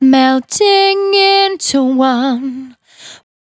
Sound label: Finally 70bpm C, Velvet Vocal; Zero-G